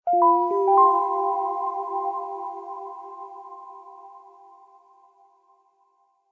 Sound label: multimedia game sound zen game soft warm relaxing twinkle tone; Captain Pegleg